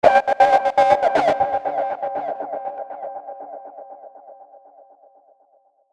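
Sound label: Musical Element, Drum Snare March; Blastwave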